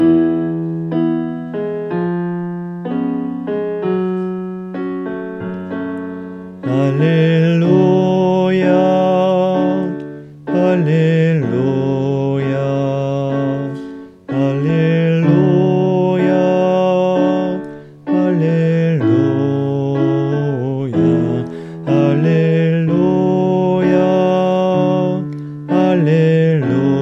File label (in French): Refrains Voix